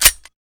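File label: slide release, m92, weapons; Benny Placeholders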